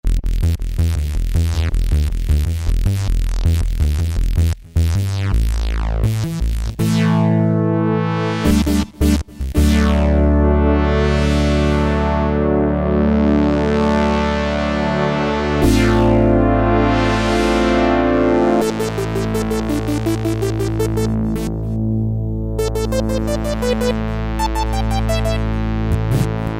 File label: Yamaha TG33; SP-Swell